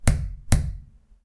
hit concrete wall; knocking

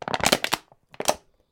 crash PET

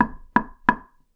knock on thick glass; knocking

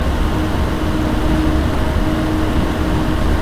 speed fan

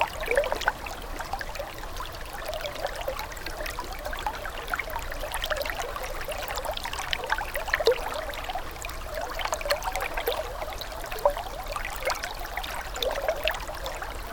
near a brook; nature

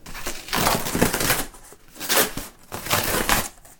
tearing cardboard

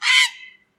heron

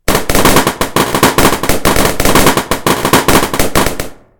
balloon bursting

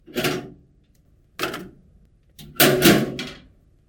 posting

